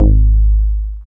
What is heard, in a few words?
BAS FM DX-7